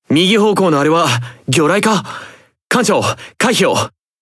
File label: Alarms Torpedo Right